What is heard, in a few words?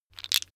tiles wood; objekte; holz